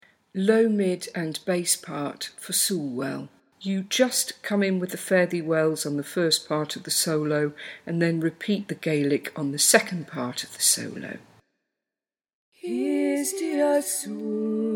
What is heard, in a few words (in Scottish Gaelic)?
gaelic LM BASS; soolwell